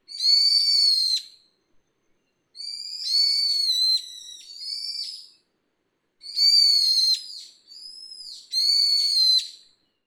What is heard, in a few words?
hiyodori, birds